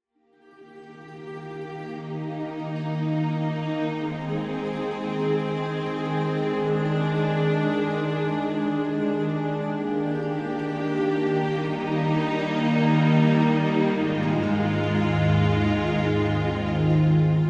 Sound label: music